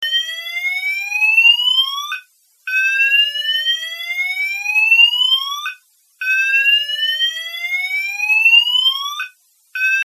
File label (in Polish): ton